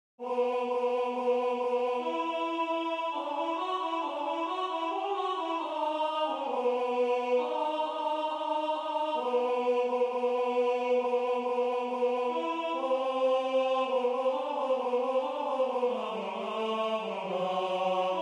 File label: Gloria Tenor; Vivaldi; Antonio Vivaldi